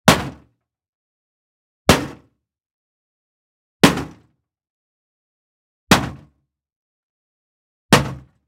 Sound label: Hit Impact Hard Metal Scrap Debris; Mechanical Wave; Undoing-Computer; GDC Game Audio Bundle